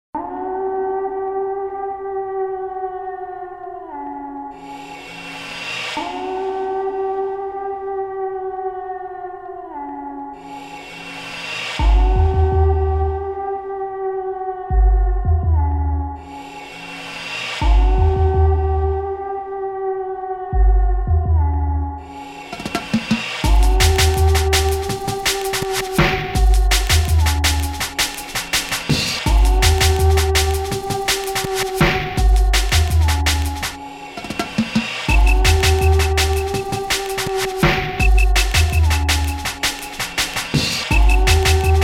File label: Hardcore Mix